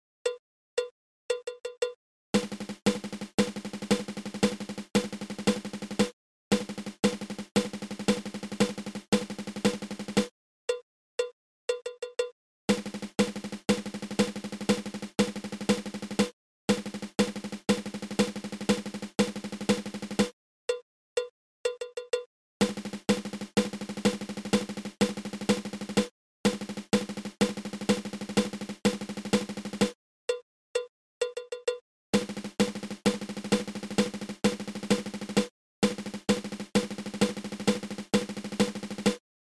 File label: Paradiddle diddle